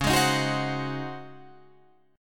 C sharp Major 7th Suspended 2nd Suspended 4th C sharp, Guitar Drop D